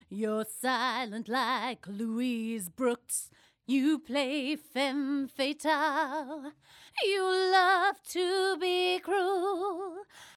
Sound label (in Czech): Vokál no comp